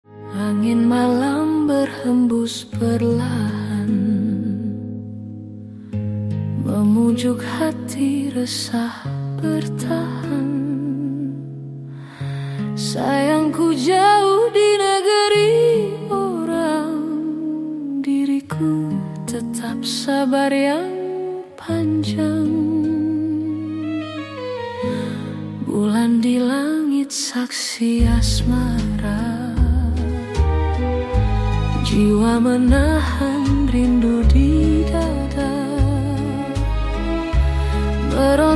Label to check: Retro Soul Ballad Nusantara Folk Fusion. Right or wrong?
Right